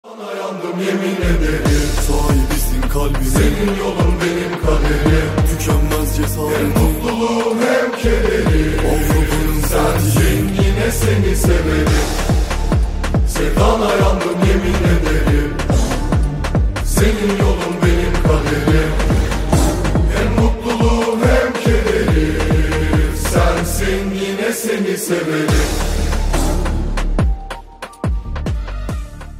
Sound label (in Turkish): Rap HipHop Zil Sesleri